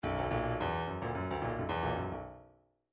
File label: bdf midi file grooves funk t funk t